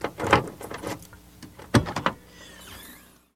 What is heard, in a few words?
hatchback open; soundspvz